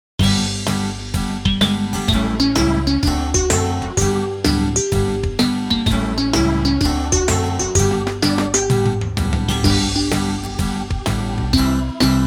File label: karaoke